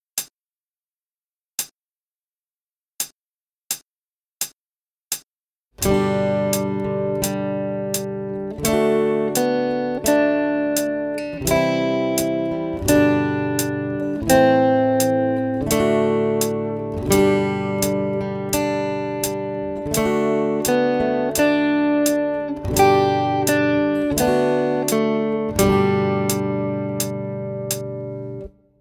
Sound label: 3 Strings fast, guitar